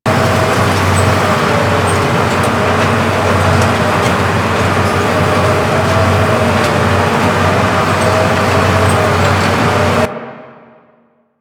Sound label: Carousel Running, Carnival; Warframe; Ambience; Grineer